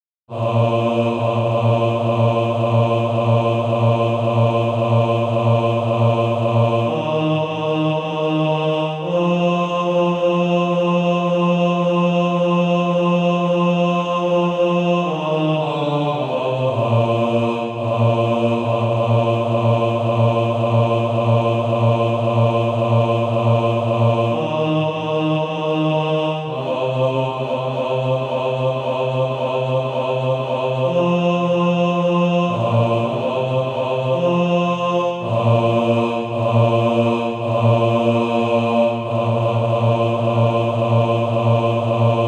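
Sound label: bass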